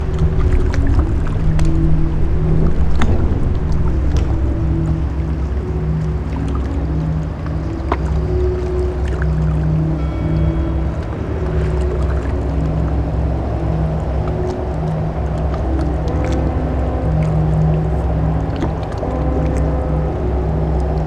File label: Ambience; Evil Dead Regeneration